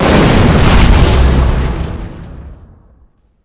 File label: railgun alt explode, weapons; svencoop; rngremake